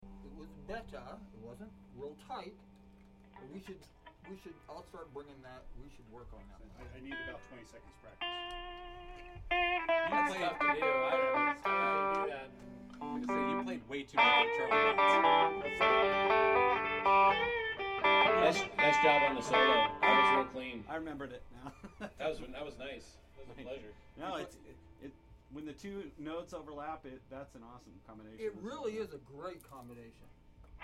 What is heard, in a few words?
Room Mic